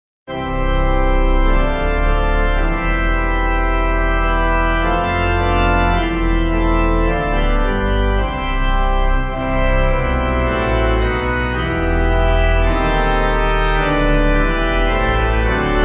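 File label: Pipe, hymn backing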